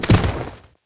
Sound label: Fall cloth